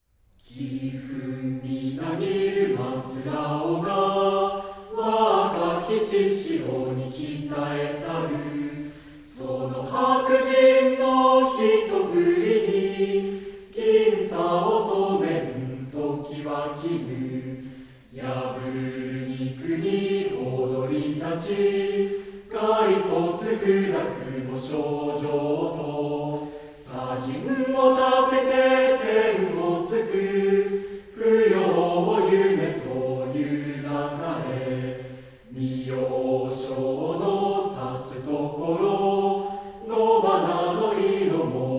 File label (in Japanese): 混声合唱; 勝利の歌(迎歌)